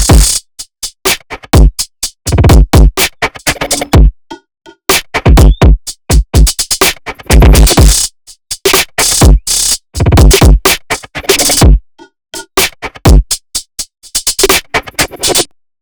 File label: drums wet; folda; sentinel av